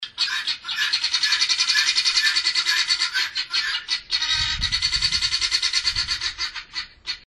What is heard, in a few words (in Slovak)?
perlicka prilbova